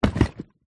luggage bag kids toys plastic wooden inside set down on carpet; zapsplat; foley